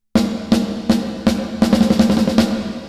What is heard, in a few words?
Roll off 24dB Octave 500Hz